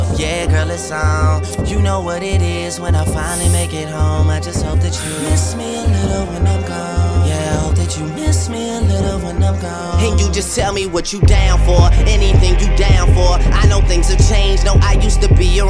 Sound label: English Song Ringtone